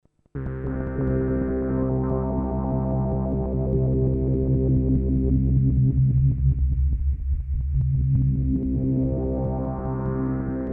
Moog Prodigy an Matriarch

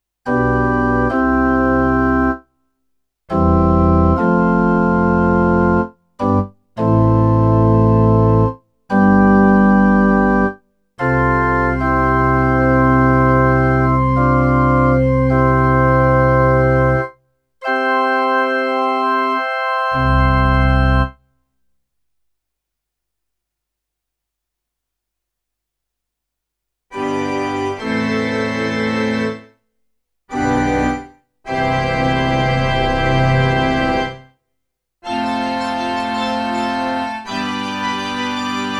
Orgel

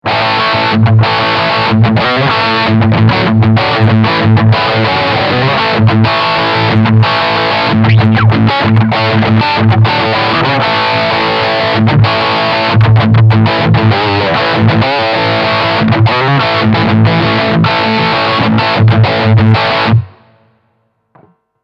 Crunch Steg HB

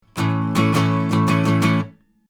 Melodium 42B Echoschall Acoustic Git 50cm Strum Hard

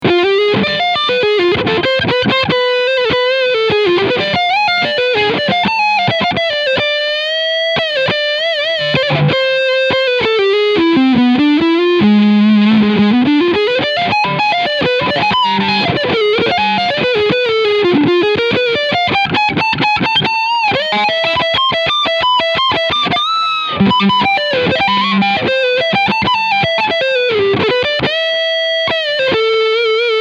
Overdrive Lead beide Humbucker; Dean Guitars Angel of Deth

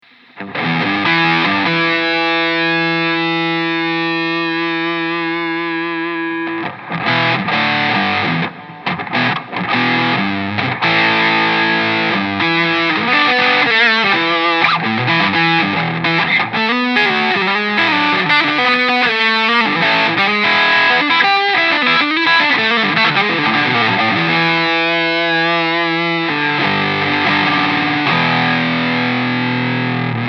Gain 14.00h Stegpickup

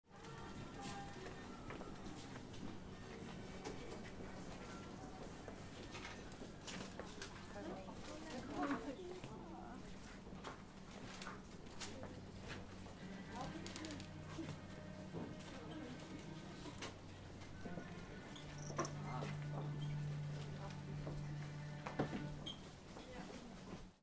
Kaufhaus; Pocketrak CX

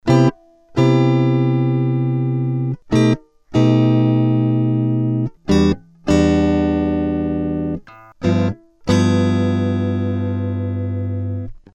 Focusrite Scarlett 2i2 Gitarre

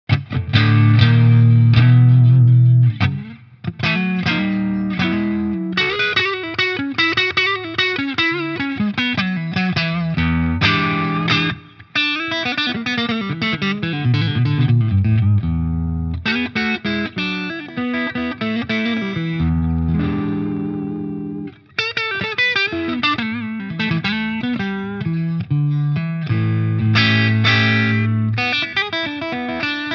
PRS HDRX 20 Head beide Kippschalter unten clean; Tr. Vol. 2h Bs. Vol. 1h

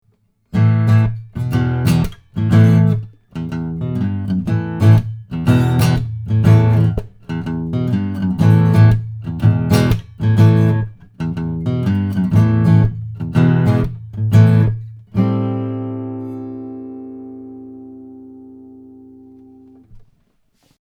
Akku stereo

